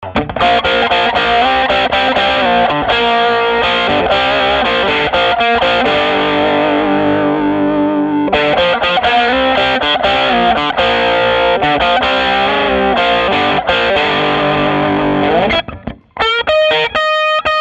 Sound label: Ribbon Solo